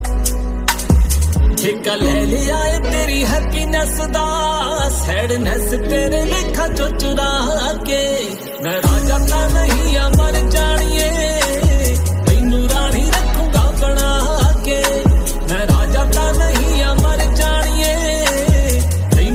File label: punjabi love ringtone